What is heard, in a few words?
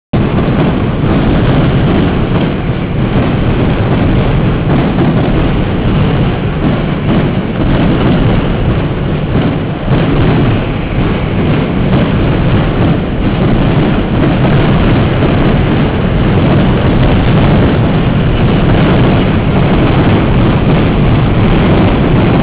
bangs